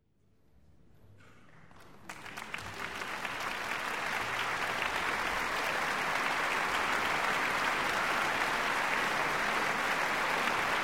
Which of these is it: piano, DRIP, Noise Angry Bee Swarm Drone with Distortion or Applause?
Applause